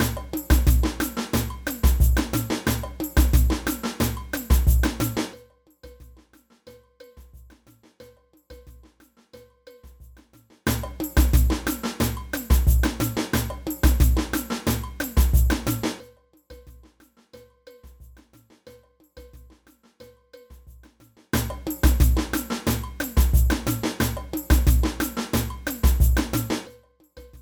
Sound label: cowbell, samba; samba band